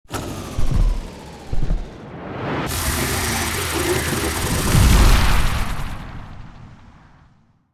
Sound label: Boss phase 2 start